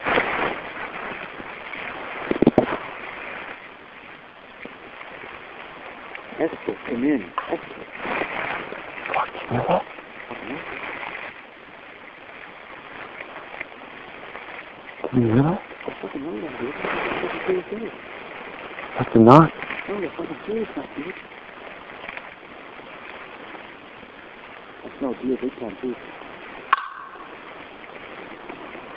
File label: Wood Knock from Ontario